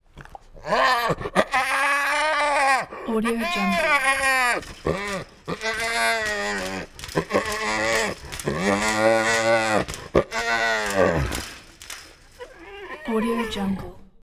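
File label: hyena angry close up grunts and moans in pain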